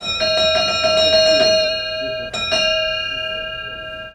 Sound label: Old auto horns